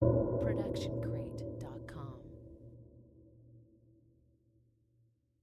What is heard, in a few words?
designer ghostly hit; SoundsCrate